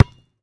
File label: Street Ball Bounce Dirt, Street Basketball; SFX Kit